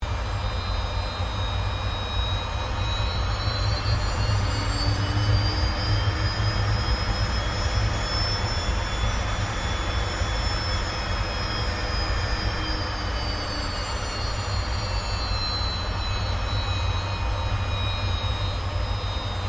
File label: AIRCRAFT CA-27 SABRE TAXI INTERIOR; CA-27/F-86 Sabre; Airplanes; Vehicles